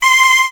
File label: cho c6, Choir, MIRAGE; Retro Sampler